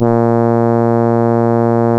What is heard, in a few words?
A#, Synclav FM, BRASS; Big Fish Audio